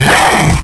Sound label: puma attack; wanted